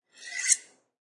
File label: Chef sharpens large knife.; Food Prep; Restaurants